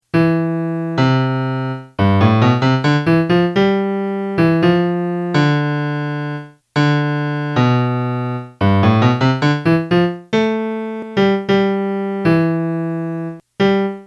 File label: melody